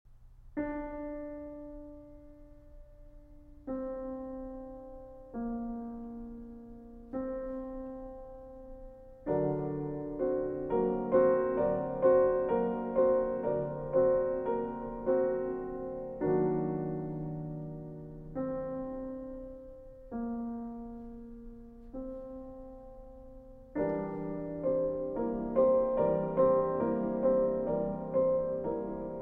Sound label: Piano Quartet, Adagio Non Troppo; No. 2 in G Minor, Op. 45 (C. 1887) III.